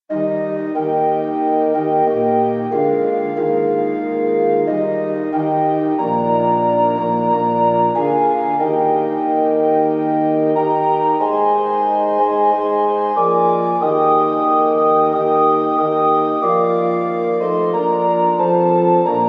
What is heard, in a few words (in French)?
orgue; mcgranahan james; chants de victoire